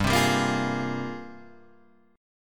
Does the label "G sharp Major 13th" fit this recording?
Yes